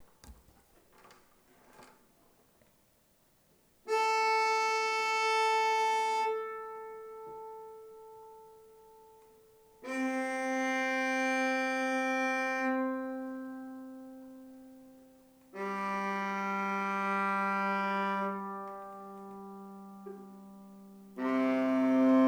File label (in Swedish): Ljudprov nyckelharpa; ljudofilm